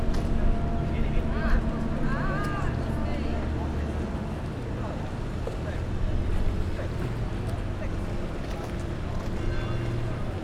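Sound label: louder water vehicle; steg; velence 2014; olaszorszag velence; hangosabb vizi jarmu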